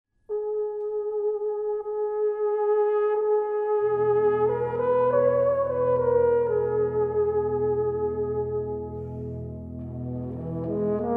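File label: euphonium cad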